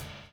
DOWN, DOWN KIT 78

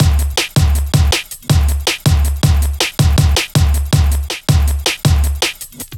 Swing, drum loop, jungle, 160.5; Zero-G Jungle Warfare 1, 2 & 3

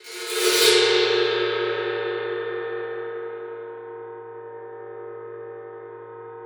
TAM T; Xsample VOL-9

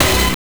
Orch Hit; Genesis

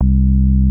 BASS Zwaluw Bass; Polyend; Legowelt

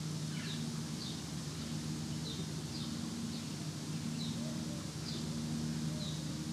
Ambi Loop, outdoor samples Ambience Loops

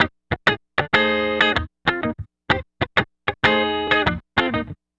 Guitar, 70s Analog Funk

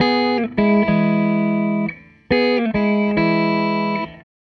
Gretsch Guitar Loop

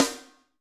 Snr Amb Hi Picc, Alesis D4

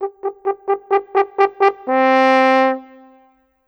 Horn, Cinematic; Sonic Foundry (Sony Creative Software); Orchestral Series VOL-3; Cinematic 21

